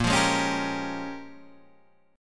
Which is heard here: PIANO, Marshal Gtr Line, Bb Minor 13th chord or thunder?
Bb Minor 13th chord